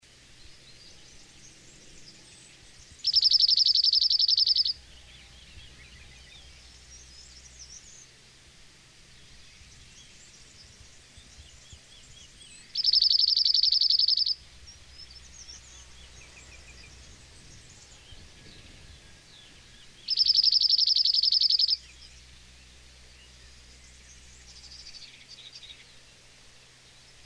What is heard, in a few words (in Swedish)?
Hacksparv; Cirl Bunting; Emberiza cirlus; Jordi Calvet